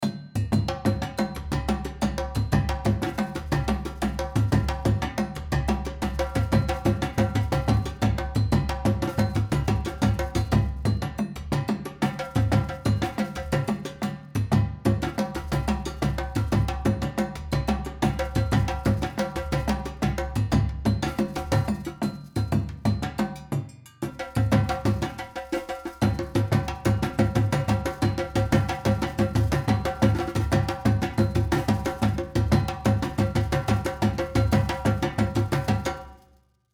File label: Combat Rhythm